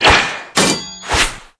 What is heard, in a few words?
event stage item shield